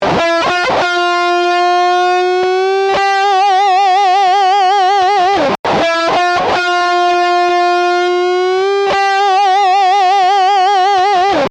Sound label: Slow Bend, guitar